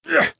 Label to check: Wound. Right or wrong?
Right